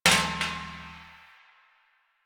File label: metal trash can impact